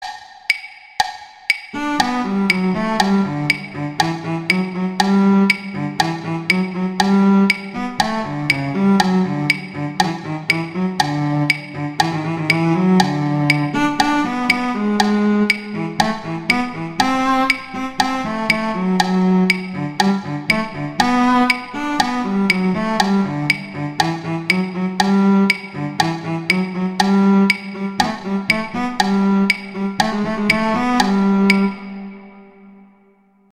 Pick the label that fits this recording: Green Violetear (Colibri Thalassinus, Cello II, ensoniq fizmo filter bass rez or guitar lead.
Cello II